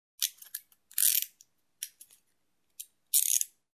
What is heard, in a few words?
Handcuffs clicking